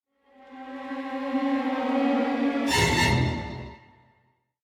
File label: Sting Horror; lego the incredibles 2018 switch